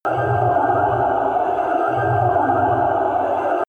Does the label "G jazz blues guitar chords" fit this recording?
No